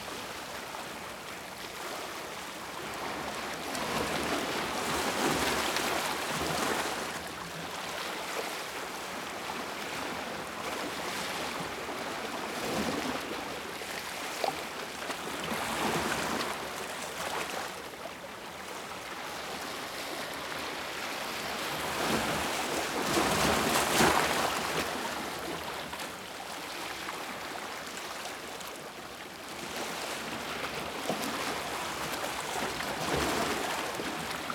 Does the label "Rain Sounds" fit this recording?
Yes